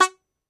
Tenor Banjo, Plucked Strings

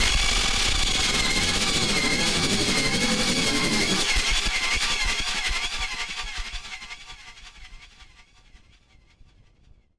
Battered Synth; malfunctioning synth samples

